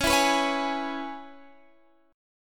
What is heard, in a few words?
Db Minor 7th Db down Guitar Standard; strummed